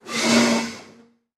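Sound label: school bus brakes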